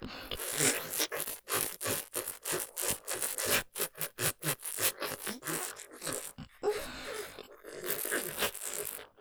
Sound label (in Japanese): フェラ音マシマシ・じゅぷじゅぷ系・ピストン絞り; 追い込み３; ステレオ